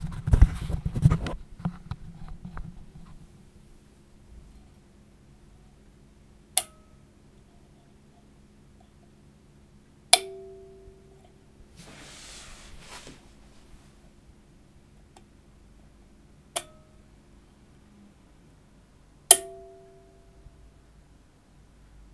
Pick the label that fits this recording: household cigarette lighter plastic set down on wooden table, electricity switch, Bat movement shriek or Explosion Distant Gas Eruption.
electricity switch